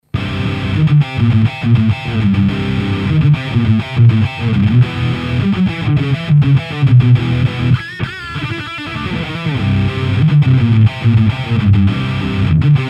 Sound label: Full Dist; JFET